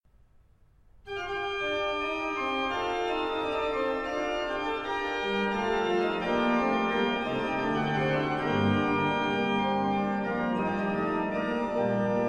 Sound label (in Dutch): Orgelpartita; Variatie 2